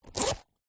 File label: purse pouch leather zip; zapsplat; foley